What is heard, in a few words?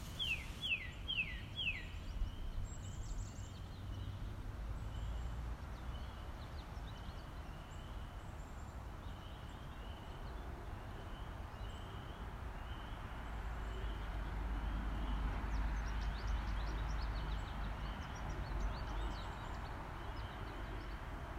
Birds and frogs and a passing car